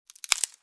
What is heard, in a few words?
Twig Snap; Environment